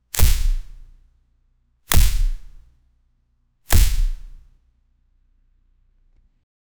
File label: punch, fighting